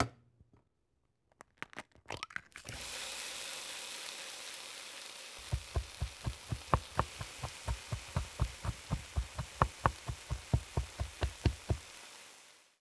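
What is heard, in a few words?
frying eggs; smazeni vajec; Mafie 1 cz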